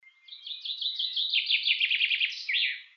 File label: Fringilla coelebs; BIOAC AVES